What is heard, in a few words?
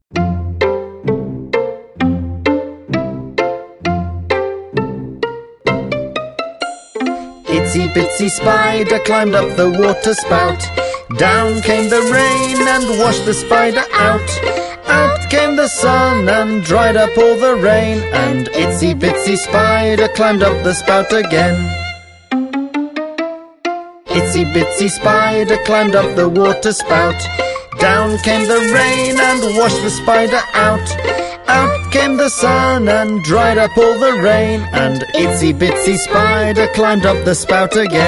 Itsy Bitsy Spider